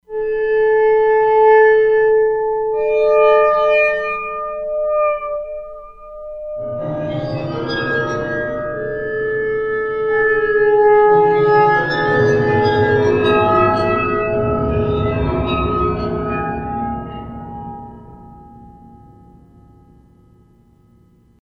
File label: Waterphone, E-mu Proteus-3; Factory patches